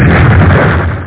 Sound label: LAZER CANNON fade; Nemeses